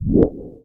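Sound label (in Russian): кнопка сухая короткий шумный булькающий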